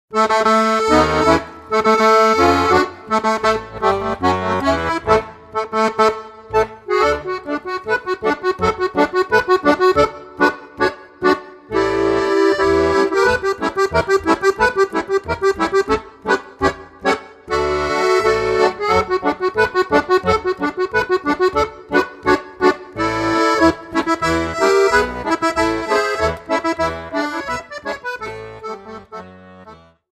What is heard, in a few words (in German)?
haimboeck marsch